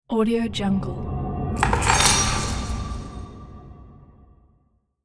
treasure prize revealed

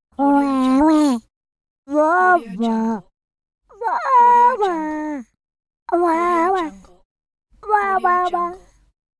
orchestral baby

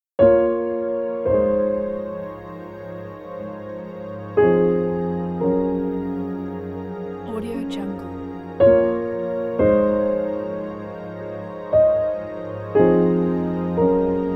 sad emotional piano

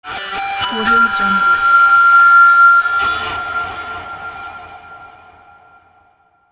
distorted guitar feedback fx

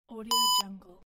beep

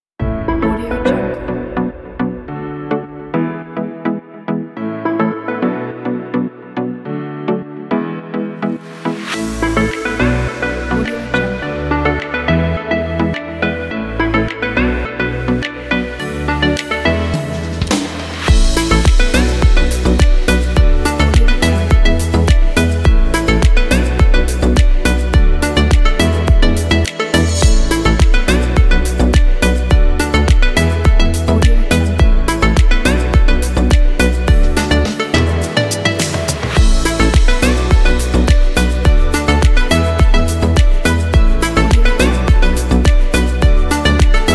summer tropical pop

